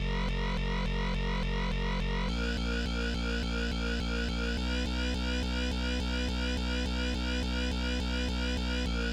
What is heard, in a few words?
New Hip Hop Bass